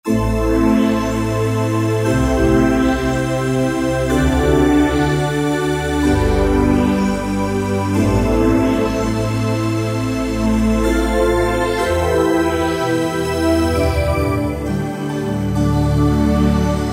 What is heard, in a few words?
Pad Oasis, E-mu Morpheus; Factory Presets